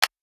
traffic light; traffic command

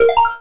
confirm; stack bump 3d

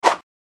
slash; slice fruit

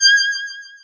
kitty opening; dinosaur run

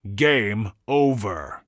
gameover; ninja boy

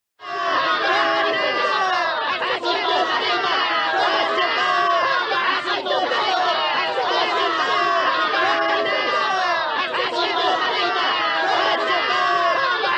crowd loop; wrestle jump

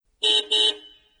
car horn; traffic command

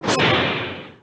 blast; killer io

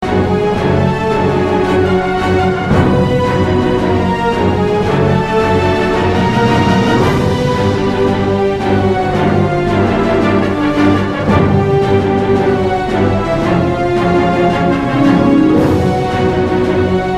main loop; air fight

